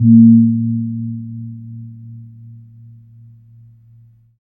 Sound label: KEMPUR, KEMPUR GONG; Spectrasonics; Heart of Asia